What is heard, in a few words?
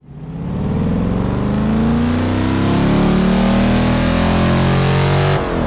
first, vehicles; gmod; tdmcars; pon transam